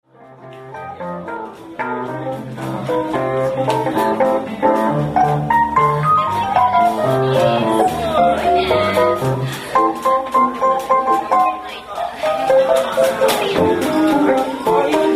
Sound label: piano